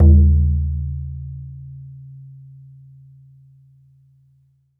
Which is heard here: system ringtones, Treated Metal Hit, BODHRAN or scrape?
BODHRAN